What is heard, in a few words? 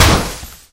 Movement Ball hit target; Talking Tom Gold Run